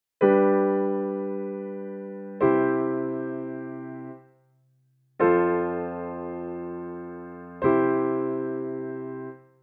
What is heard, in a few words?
Authentic and plagal